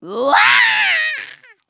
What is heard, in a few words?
death flying, grunt involuntary; covy involuntary